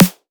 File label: snare glass curtain